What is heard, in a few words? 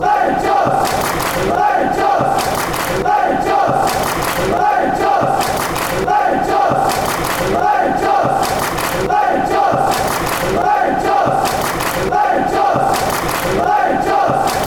clap; rangers